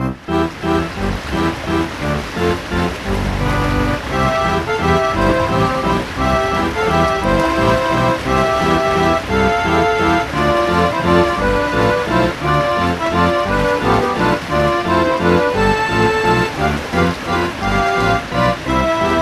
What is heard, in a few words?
cinematic rubber tubing; Theme Park